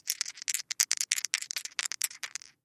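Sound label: dice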